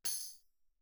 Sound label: tambourine normal mf; Percussion